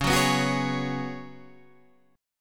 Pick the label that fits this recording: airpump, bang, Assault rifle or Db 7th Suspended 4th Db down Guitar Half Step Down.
Db 7th Suspended 4th Db down Guitar Half Step Down